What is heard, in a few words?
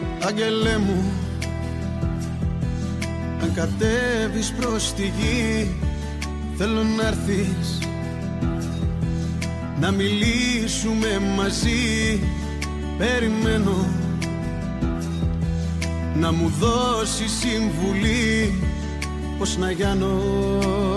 greek music